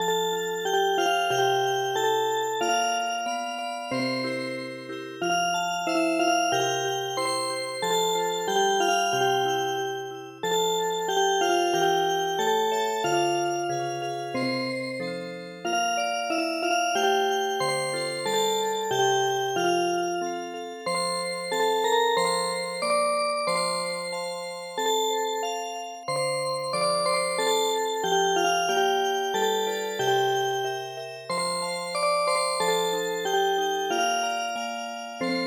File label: Music Box, traditional